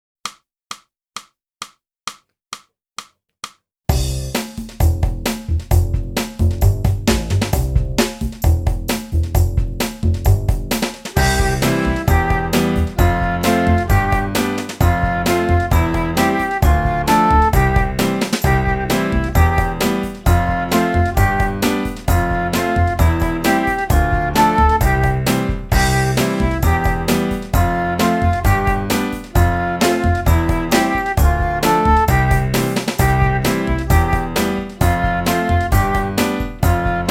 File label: flute